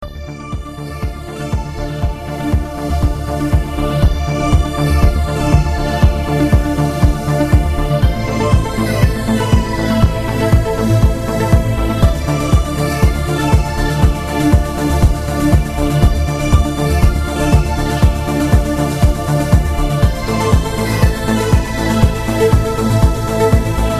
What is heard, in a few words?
ringtone